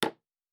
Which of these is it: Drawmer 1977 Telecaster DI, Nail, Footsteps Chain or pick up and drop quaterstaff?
Nail